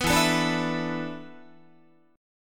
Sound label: E 7th Suspended 4th, Guitar; E7sus4; strummed